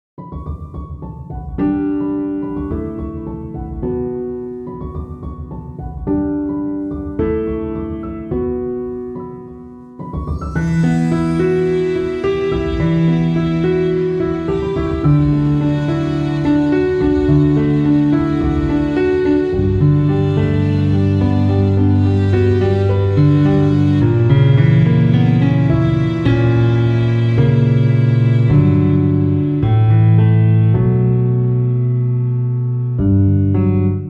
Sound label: instrumental